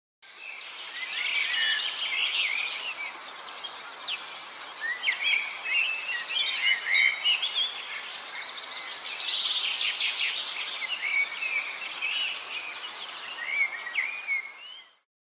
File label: sunny day